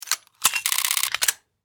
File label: Steampunk Gear Wind, Scifi, Steampunk, Gear, Wind, Device, Clicks, Crank; Science, Fiction; Steampunk Sound Effects